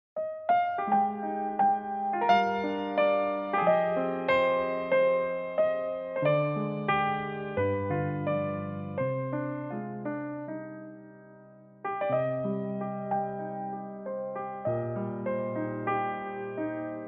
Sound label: piano